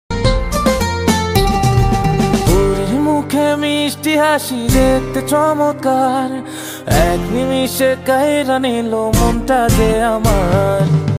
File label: bengali song